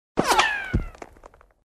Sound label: Bullet sound